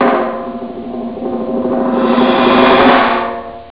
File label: drumroll; warlords; ST-XX Amiga Soundtracker Sample Packs